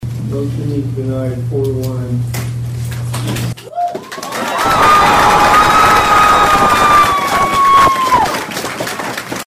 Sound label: crowd